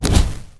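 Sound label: gold explo, attack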